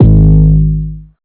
hip hop

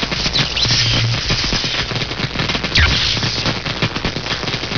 electric damage

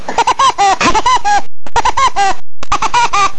woodpecker

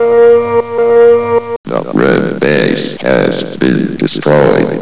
red lose

